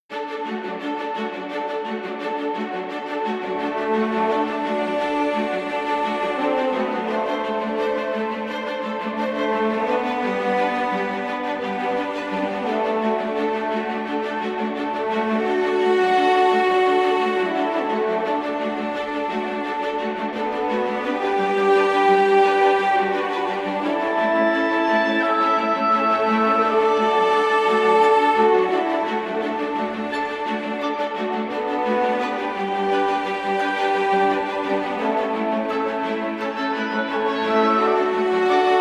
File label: grand adventure, music